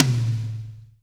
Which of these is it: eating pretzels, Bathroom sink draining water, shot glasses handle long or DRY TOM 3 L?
DRY TOM 3 L